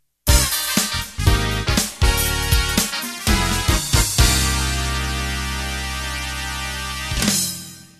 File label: Jazz Funk End